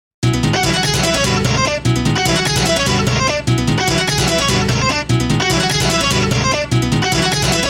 jam

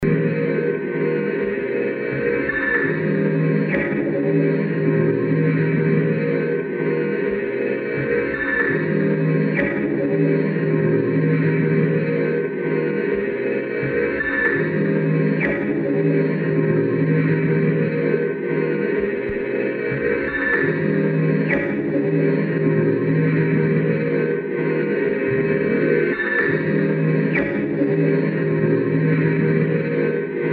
Solo

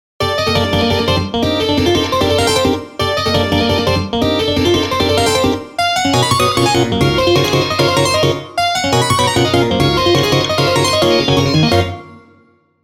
NoDrum

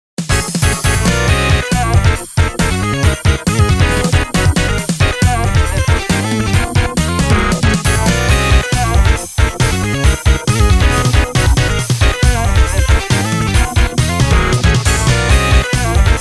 loop

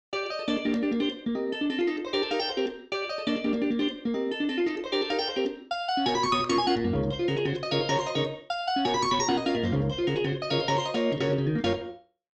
Gtr Only

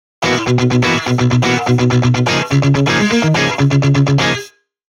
chug

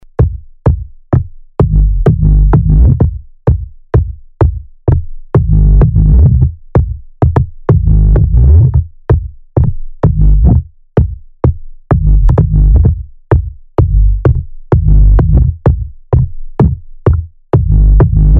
beatz

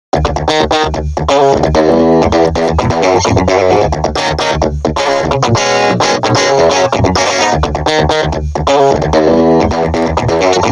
gtr

